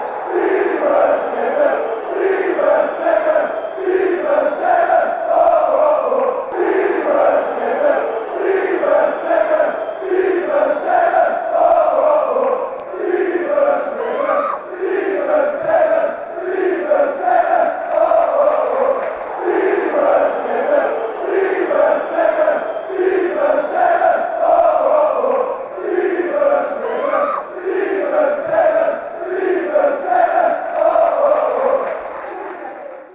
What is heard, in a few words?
oh oh oh genk, chants